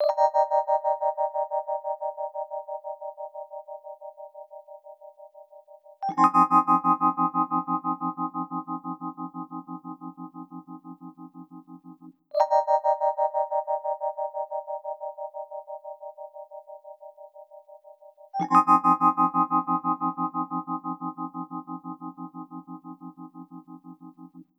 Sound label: rhodes, loops